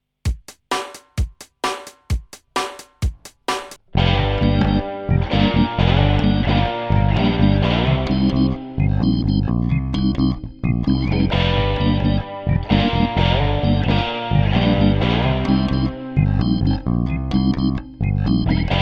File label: surfgroove